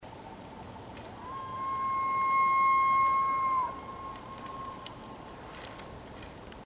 bugle locator, elk; Locater

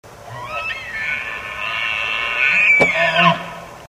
big 6x6 demanding, elk

